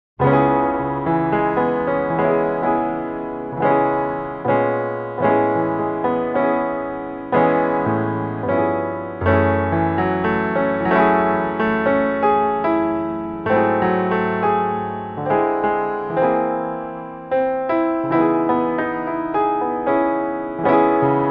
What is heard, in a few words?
solo piano